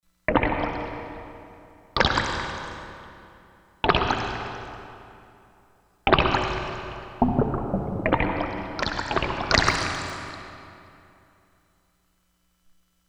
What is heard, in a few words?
Water Drop; Korg MSC-16S Environment